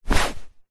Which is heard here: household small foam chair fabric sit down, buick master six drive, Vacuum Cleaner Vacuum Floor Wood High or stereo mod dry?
household small foam chair fabric sit down